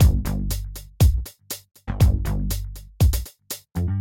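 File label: free spins outro loop; dazzle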